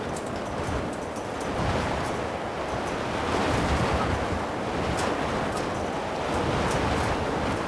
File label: Wind Small Clanking; ambient